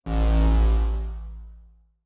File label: dragon ui; Dragons Myth